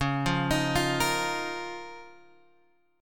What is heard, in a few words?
Db 6th Add 9th Db down Guitar Open G